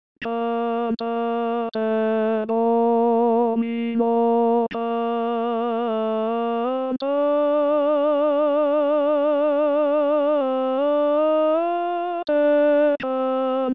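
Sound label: tenor; Pitoni; Cantate Domino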